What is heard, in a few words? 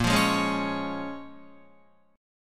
Bb Minor 7th, Guitar, Bbm7 chord; Bbm7 strummed